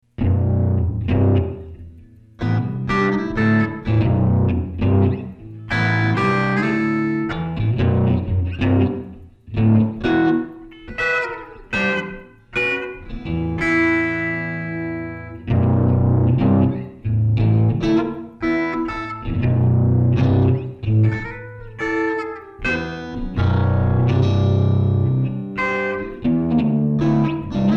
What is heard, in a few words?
zipper loop